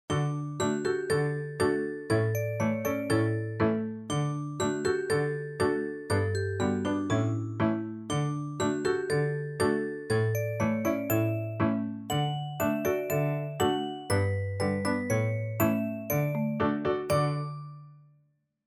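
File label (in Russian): милая озорная мелодия детская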